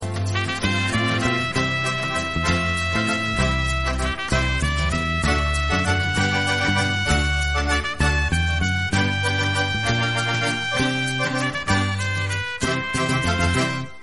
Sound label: theme; kommissar rex